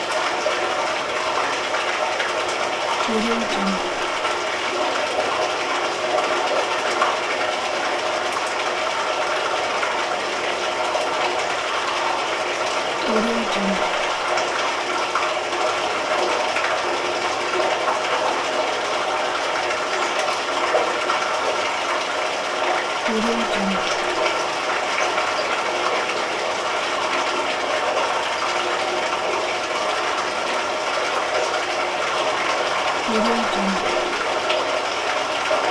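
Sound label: ambience sewer drain; prosoundfx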